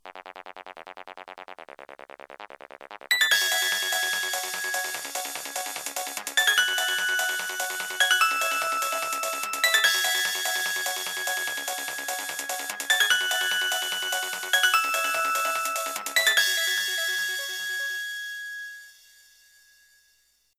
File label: nokia 1208 everlasting ringtone